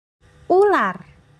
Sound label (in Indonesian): ular